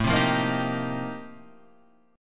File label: F 11th A, chord